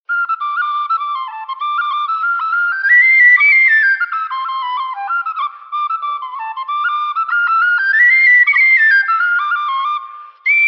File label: Freeman Tweaked G Generation Hornpipe, whistles